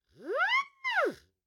acme whistle; prosoundfx

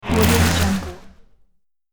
robot war element

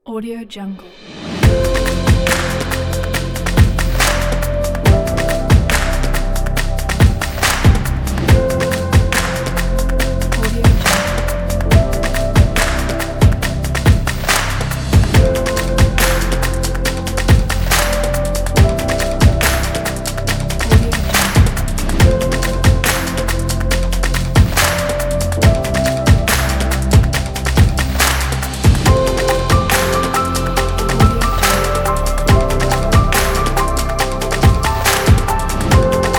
motivational piano and percussion

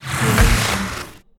ice explosion

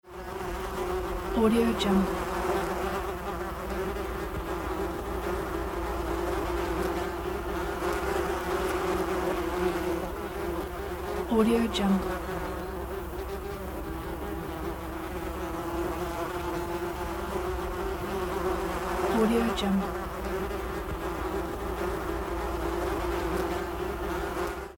wasp nest

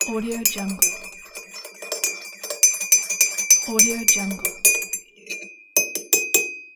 tea stirring

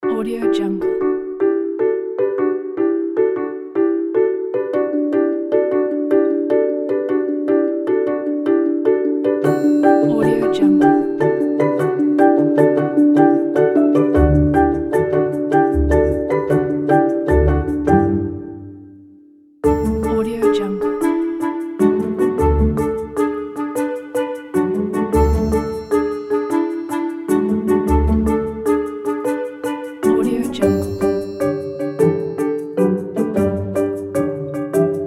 quirky comedy

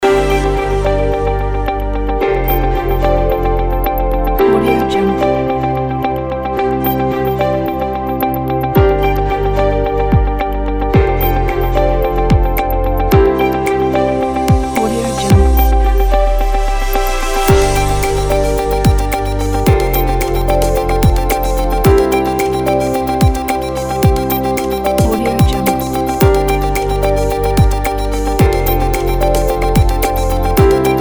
tech science loop

